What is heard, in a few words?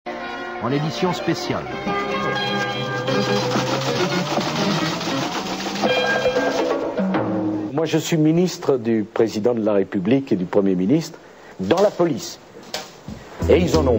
Riddim